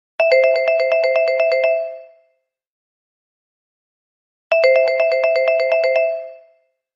Cool ringing

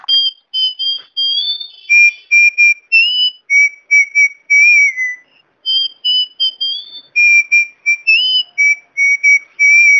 Whistle

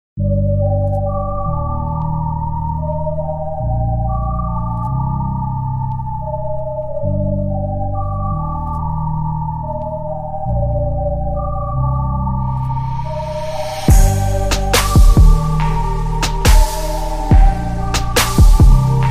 Smooth Type Beat

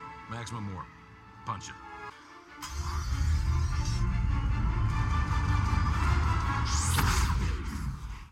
Punch It

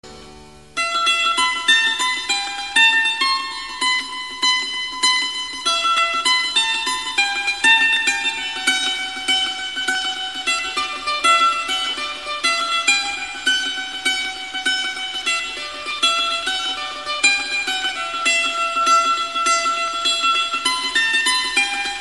Indian Guitar

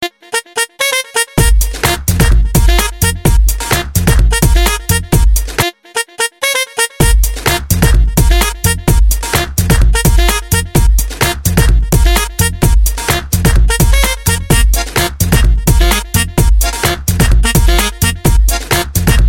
Sax Livemix

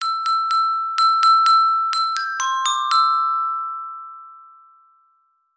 Jingle Bells

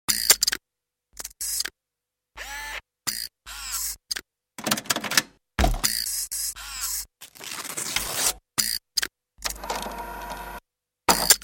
Mechanical Noise

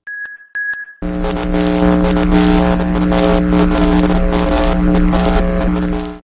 Glitch Ringtone

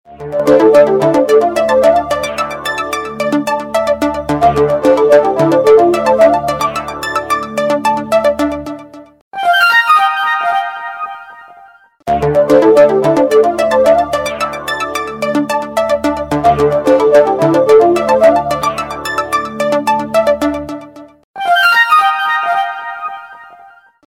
3d Business Ring

